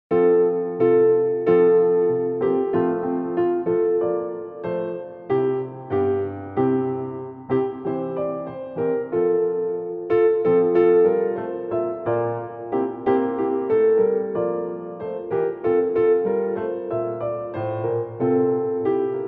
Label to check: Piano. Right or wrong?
Right